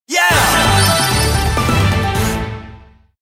mystery win mid; Magic 27

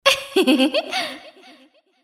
moan; Imperial Girls

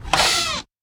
bonus symbol barrel open; Hungry Shark; Wazdan

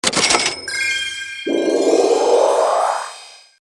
UI money; Paradise

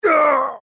assassin dying; Ming Imperial Guards

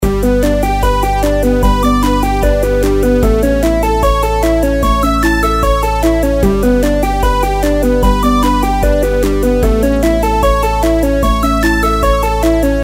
intro bgm; Horoscope

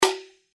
reelspin click; Legend Of The White Snake

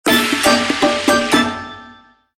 WIN SMALL; Orca

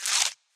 gambling mouseover; games; Fenix Play 27 Deluxe; Wazdan

